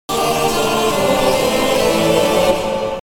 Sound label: Multiplayer Samus Appearance Jingle; Metroid Prime Hunters